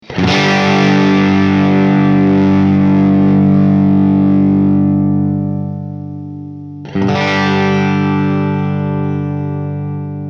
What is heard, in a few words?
fender 57 deluxe